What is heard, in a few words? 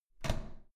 door handle down hard